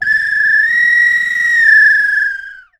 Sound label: bird calls, percussion